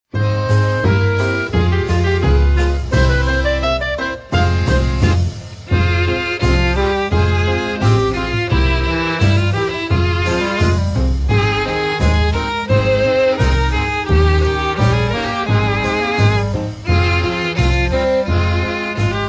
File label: Instrumental